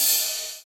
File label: Ride; Equipped Music; Loungin House